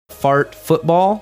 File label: fart